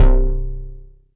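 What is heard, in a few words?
mbrute synth, Arturia MiniBrute; DRUM MACHINES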